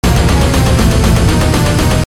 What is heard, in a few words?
loop